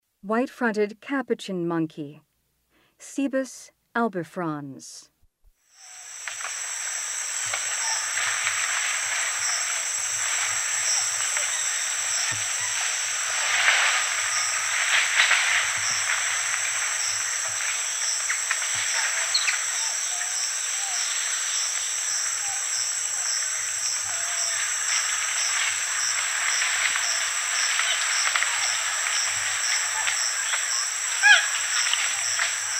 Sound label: White-fronted capuchin monkey; S. America; Rainforest